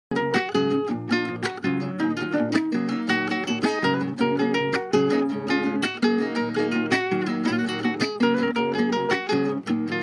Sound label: Guitar